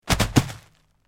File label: Impacts On Bubble Wra; bubble wrap